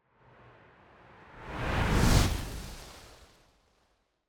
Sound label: Whoosh, Blast, Energy, Crispy, Very Slow, Crackling Approach; Sound Design; Power Accents; Airborne Sound